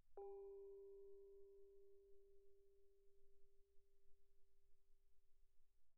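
thai gong G4 pianissimo, Percussion gongs & tamtams; thaigongs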